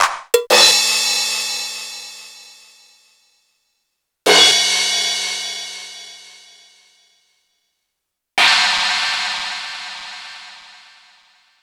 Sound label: Roland U220 all